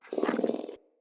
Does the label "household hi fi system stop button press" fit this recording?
No